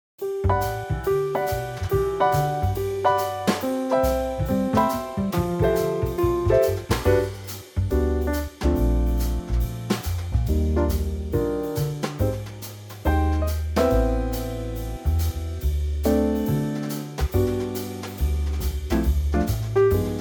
swing; vocal range - D to E; Trio arrangement of this jazz classic, brighter in tempo and using Real Book changes.; Jazzville

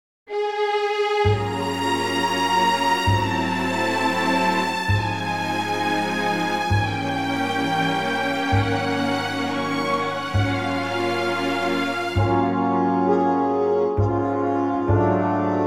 D b orch

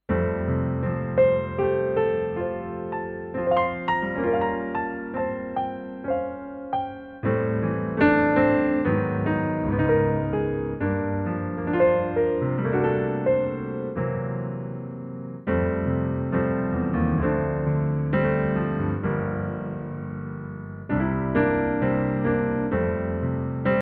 F piano

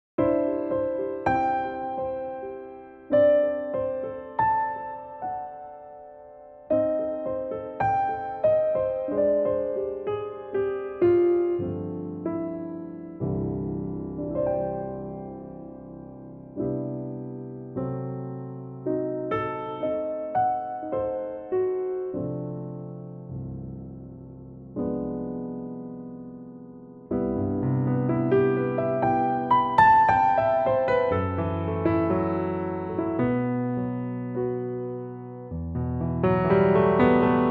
C piano; C Pianotrak; key - C - vocal range - G to G

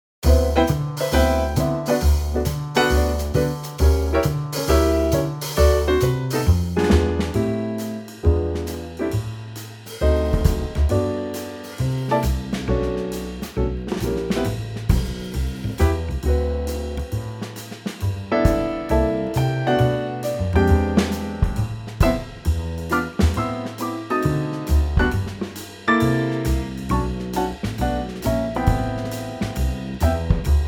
G Trio

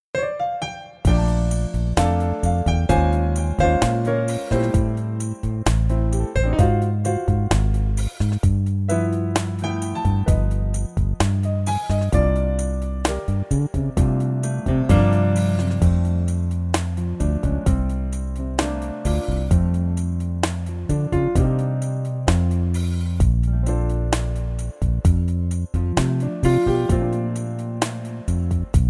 F funky

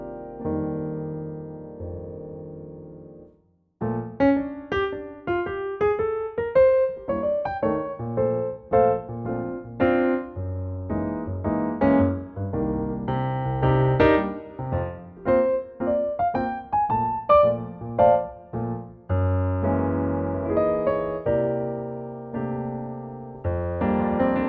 Bb piano tempo; key - Bb - vocal range - F to Bb; Very lovely piano only arrangement; Pianotrak main theme

